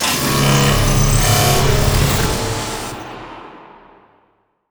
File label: archeology event energy field; ancient relics